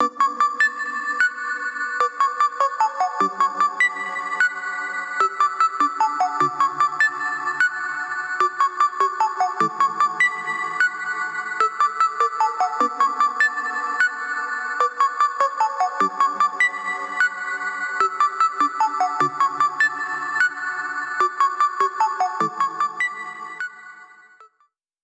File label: BREAK PLUCKS